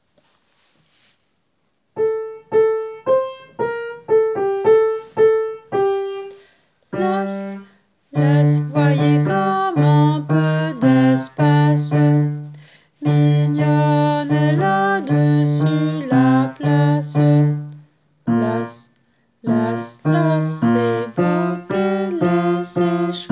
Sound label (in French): basse; Mignonne allons voir si la rose